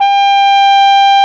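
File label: G5, mute trumpet; AKAI S-Series CD-ROM Sound Library VOL-2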